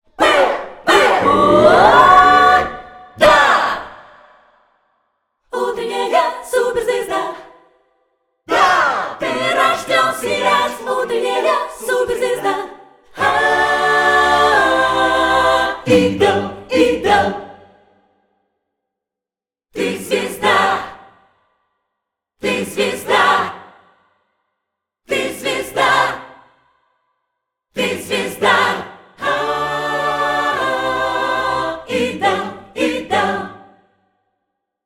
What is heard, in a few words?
ALL CHOIRS